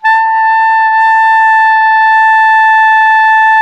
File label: ALTO PP A#4, SAX; Greytsounds - Philip Wolfe David Crozier's Saxophones